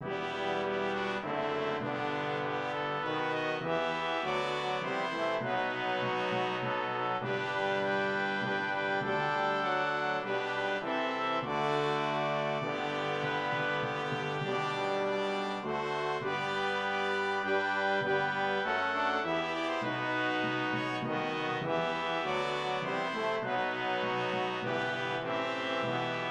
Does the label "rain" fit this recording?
No